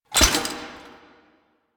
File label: Sniper Alt Fire Mech React; DE; Warframe; Frumentarius; Exalted Sniper